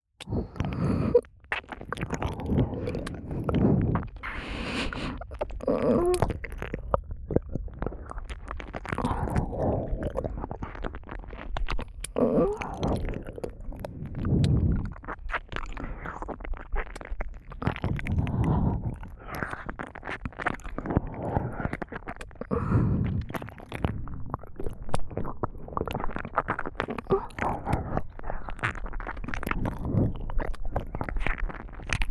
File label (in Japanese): (右)耳奥舐めねっとりエッチ, KU100 パンパン系効果音版; 舐め音比べ